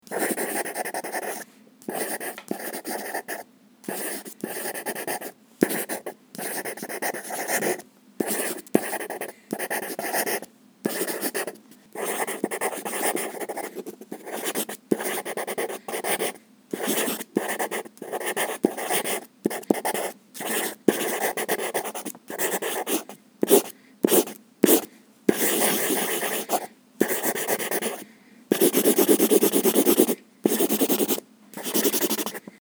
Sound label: Drawing with pencil and marker